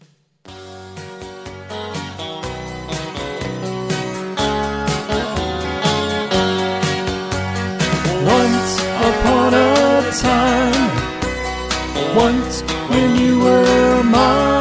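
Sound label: Instrumental with background vocals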